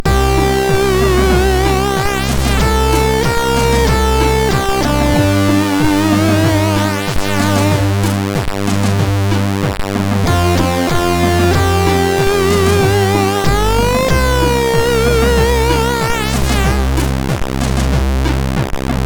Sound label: Old Music, MOS6581R2